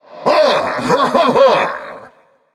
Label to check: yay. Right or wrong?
Right